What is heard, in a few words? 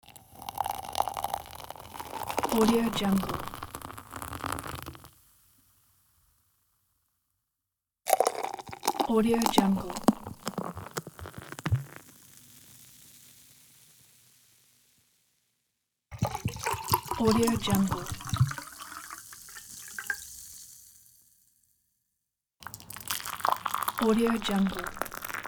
liquids pouring pack